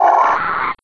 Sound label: zombine pain; scmod